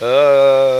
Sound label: uh male unsure; ooh ahh; uh um